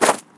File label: gravel footstep; everness